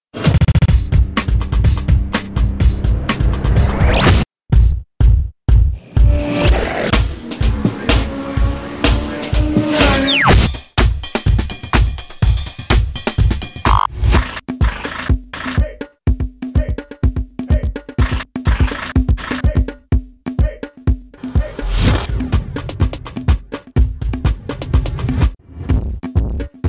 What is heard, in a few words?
bed tribal car